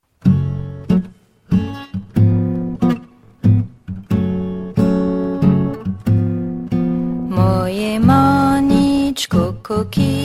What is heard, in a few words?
Mo menichko BULGARE